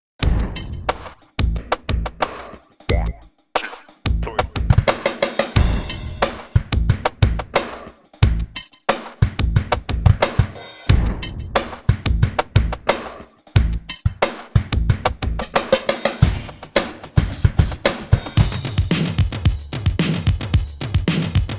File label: Demo Music - Looping; Time + Space - What's Next!